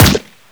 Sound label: water; garrysmod